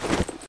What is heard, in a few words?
Gold Buy; Blockman Go; Android